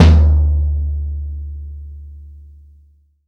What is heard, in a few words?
GONG BASS